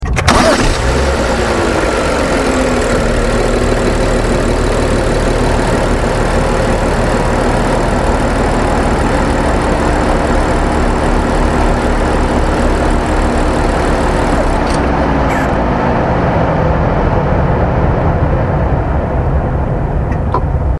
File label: Hybrid 3 Conventional Ignition